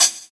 tamed hat a sharp key, pedal hi-hat sample, Loudest frequency: 6226Hz